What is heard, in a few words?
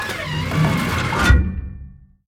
gun reload; World of Tanks